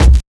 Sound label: combidrums, techno; single-drums